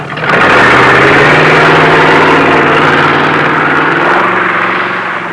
CROPDUST, aerei; trasporti

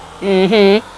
"MMM-HMM"; gemiti esclamazioni mugolii

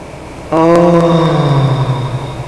ohhhh; gemiti esclamazioni mugolii

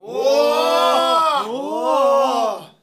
"OHH"; gemiti esclamazioni mugolii

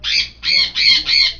scimmie monkey; animali

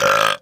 BURP, cartoons

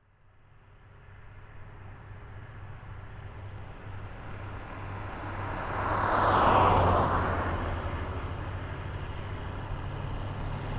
cars passing; trasporti; auto

